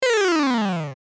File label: Line graph decrease animation infographics